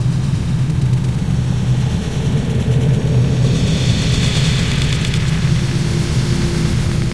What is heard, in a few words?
loud ambience; hl2; kraken